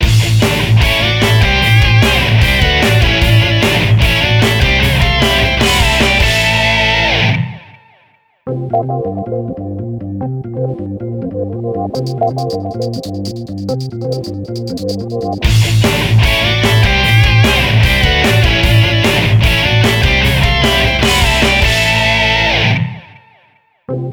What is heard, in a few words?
Hoverbike Race Victory